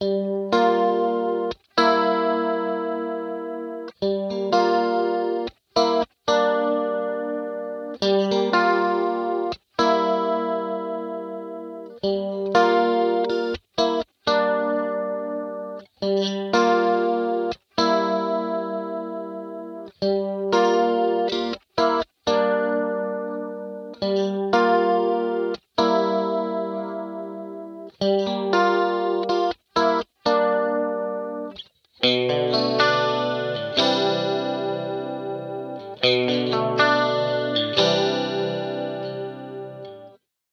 Electric Guitar Juno

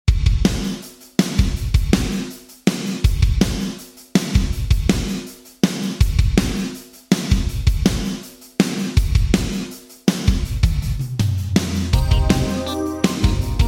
Drums Yamaha SPX900 Percussion ER Vintage Digital

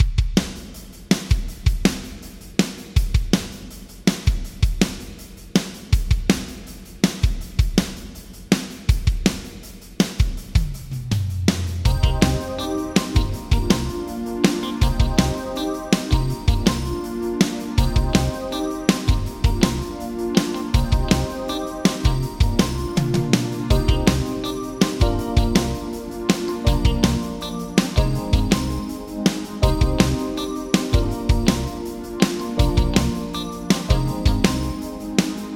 Drums Yamaha Rev7 Echo Room Vintage Digital

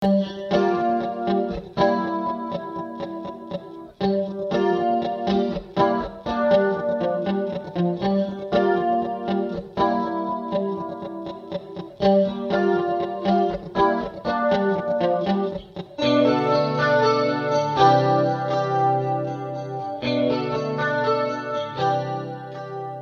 Korg A1 Guitars Chorus

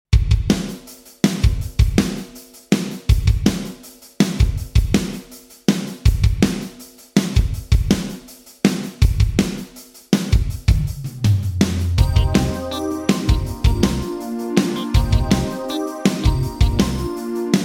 Drums Sony MU R201 Kick Gate Vintage Digital

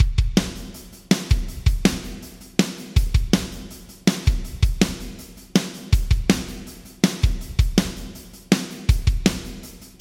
Drums Yamaha ProR3 Small Hall 1 Vintage Digital

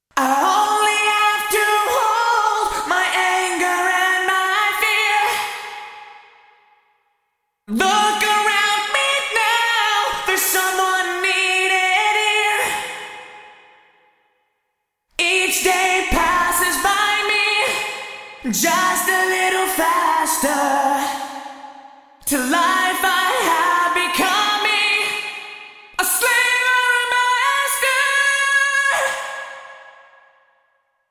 Korg A1 Vocal Bright Plate

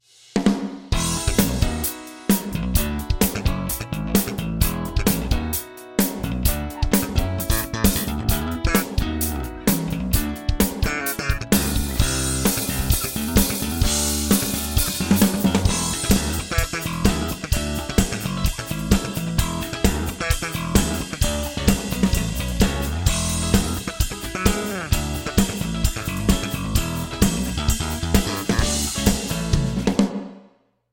Ensoniq DP Pro Drums Band Big Room

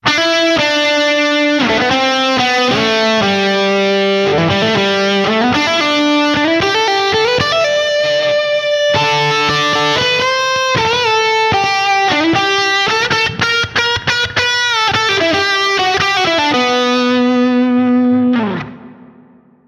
Guitar Solo Dry

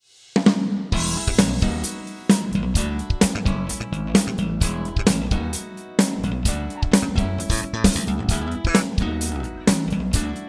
Korg A1 Drums Gate Plate

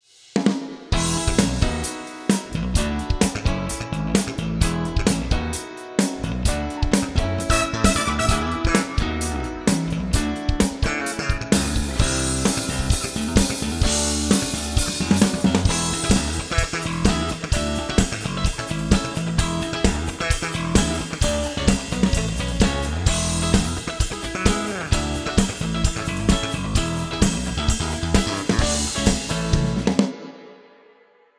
Band Ursa Major Space Station Cloud Rev Medium

